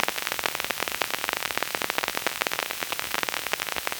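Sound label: intense sharp vinyl static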